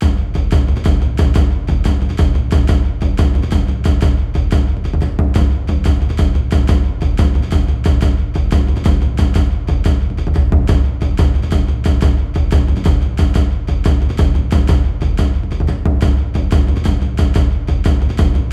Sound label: Framedrum, 90BPM, Percussion; Ghosthack; SkyRealm; Percussion Kits; Kit 18 - Under Seige